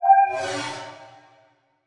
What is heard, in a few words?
Transition Metallic Hit To Whoosh; Production Element; Title Transition; AdobeCC